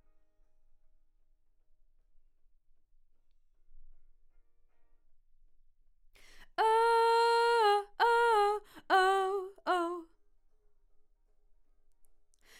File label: Vox start new